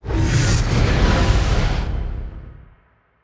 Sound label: chimera wings